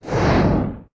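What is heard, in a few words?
Hit long range fire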